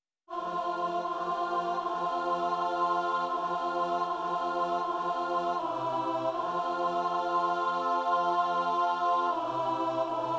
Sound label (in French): Notre pere alt